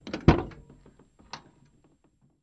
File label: Cutlass Door Open, doors